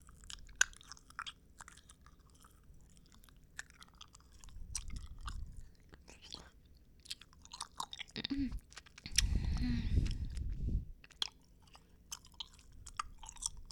フェチ ガム ループ用 バイノーラル

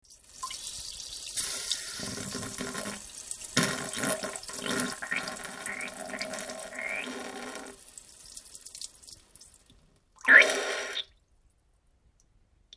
おしっこの途中から下痢おなら, ASMR

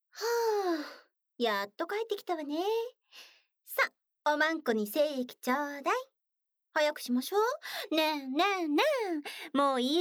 家で耳舐め; 効果音あり

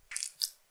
くちゅ音単発, ASMR

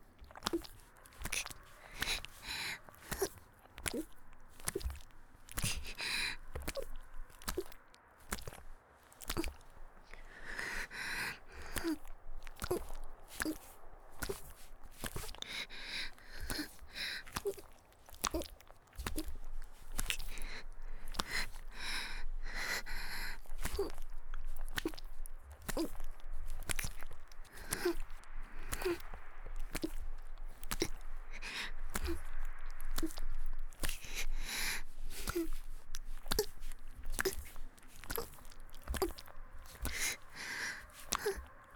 (木枯らしバージョン) ギシアンループ; 田舎の別の無感情少女と自己犠牲愛

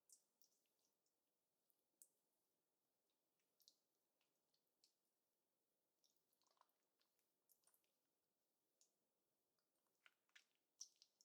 挿入, アダルト系

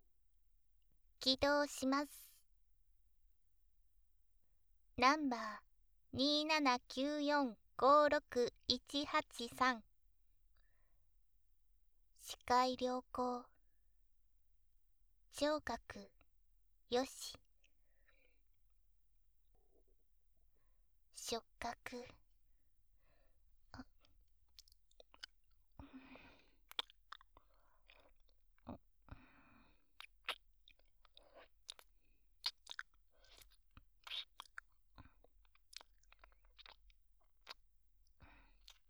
耳舐め; 初めまして、マスター

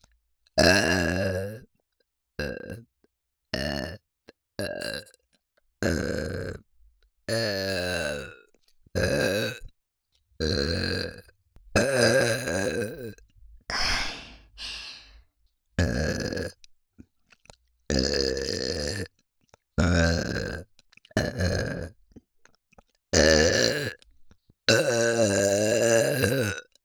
ゲップのみ; ハナ

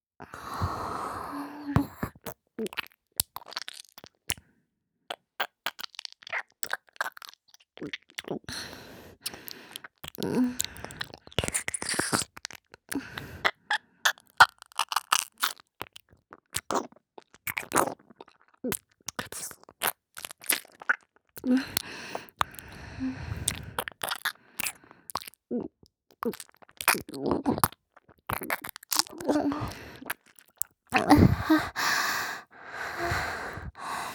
じゅぶぶぶぶぅ…, ASMR

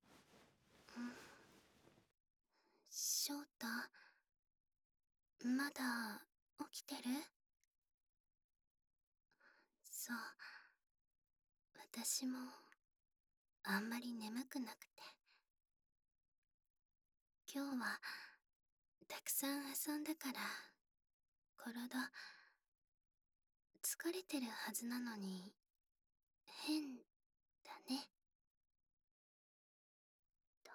優しく授乳手コキと耳舐め

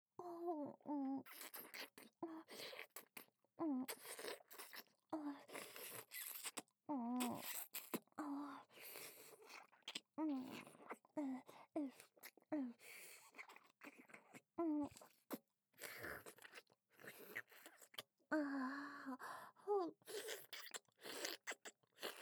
フェラ; 喘ぎ、耳舐めのみ

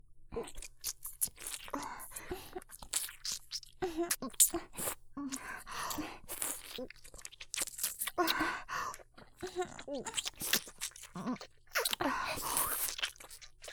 追加フェラループ; 効果音なし

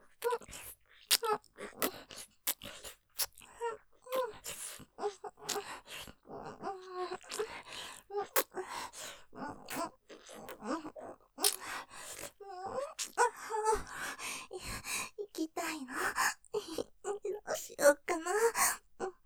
フェラ音マシマシ さらに舐め音多めに ねっとりと; 行きたいの; ステレオ

